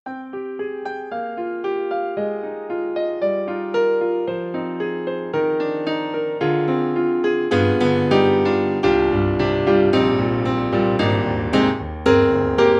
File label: Piano; KORG; KRONOS 2